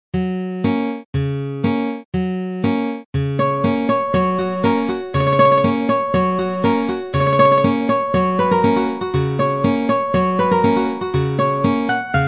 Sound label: rag african duala song dube guitares